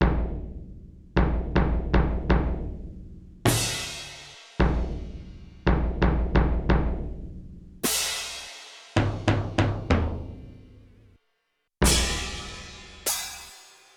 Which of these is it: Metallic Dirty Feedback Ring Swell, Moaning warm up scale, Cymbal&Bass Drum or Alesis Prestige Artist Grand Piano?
Cymbal&Bass Drum